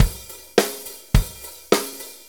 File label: 4-4 Loop, Drum Loops, Full Kit Loops; Drum Tools